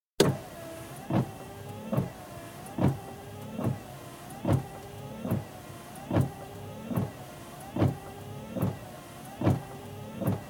Car, interior, windscreen wipers, slow; Car Sound Effects; Cars (Sound Effects:Vehicles); BBC

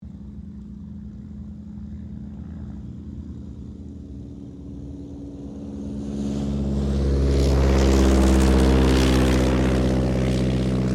AIRCRAFT AUSTER III TAKEOFF; Airplanes; Vehicles

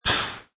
Pneumatic Valve, Short pressure release; Air Pumps; Machines

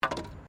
Wood Fall

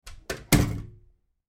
household door wooden internal shut; Door Shutting - Wooden Internal Door Closed; Miscellaneous Doors; McKinneySound

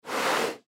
Leopard Breathe Out; Big Cats; Big Cat Sound Effects; Animal

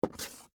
Pumps Scrape, Footsteps, Peep, Toe, Wood, Surface

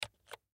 Pc Keyboard Vintage Single Key Soft Push; Computer; Technology; Typing

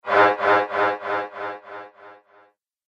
Laser Energy Element

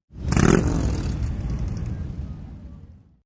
Motorcycle Reving, Motorcycle revs up; Part of Motorcycles (Sound Effects:Vehicles)

